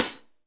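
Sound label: hi-hat, Real Mega Drums; Best Service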